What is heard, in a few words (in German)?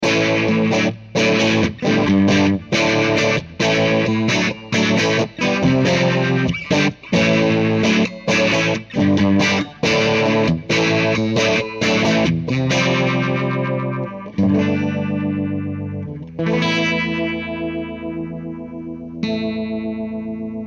Zerre